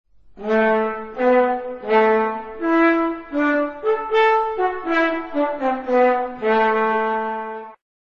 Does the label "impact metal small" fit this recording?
No